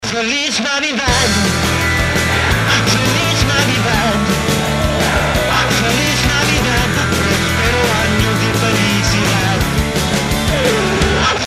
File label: 1812 scratchy; 1812 feliz